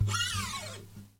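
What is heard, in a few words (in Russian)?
звуки издаваемые ударами по моей гитаре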